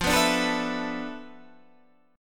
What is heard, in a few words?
D Minor 6th Add 9th F, guitar chord